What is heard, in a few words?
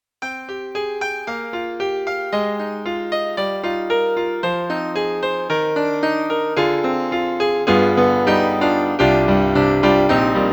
Acoustic Grand Piano; E-MU; Emu8805; SoundEngine